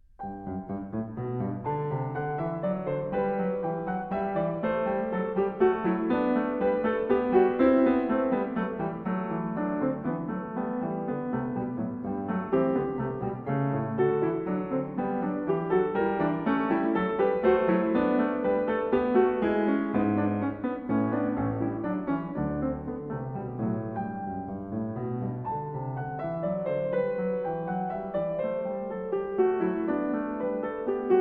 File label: Goldberg Variations BWV 988 Variatio 6 a 1 Clav. Canone alla Seconda; KIMIKO ISHIZAKA